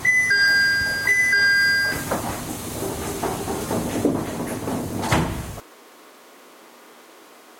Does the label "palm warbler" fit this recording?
No